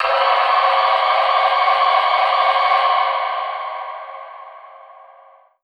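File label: alarm siren, reverb processed, 170bpm; musicradar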